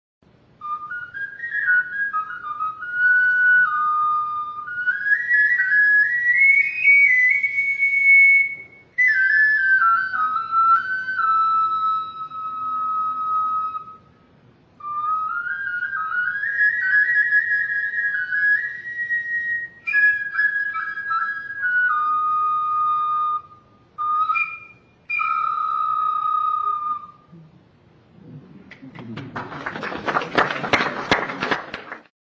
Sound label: flute